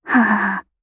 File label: laugh; Eternal Return; Katja; KOR